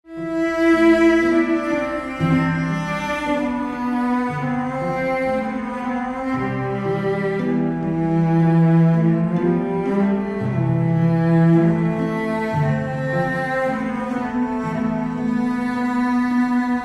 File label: Cello Version; Harold Halibut